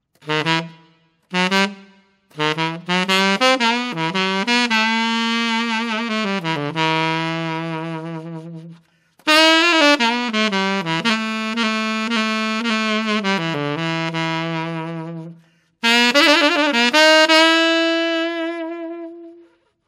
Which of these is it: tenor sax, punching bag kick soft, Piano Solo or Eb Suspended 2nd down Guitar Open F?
tenor sax